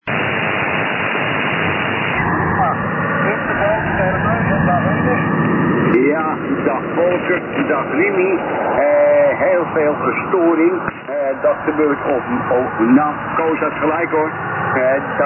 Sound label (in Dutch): S DIGI storing